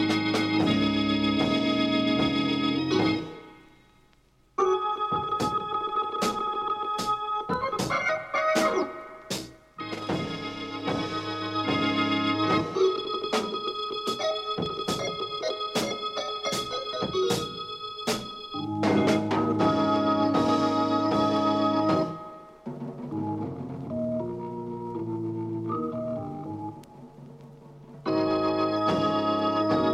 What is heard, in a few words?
Rock Dirge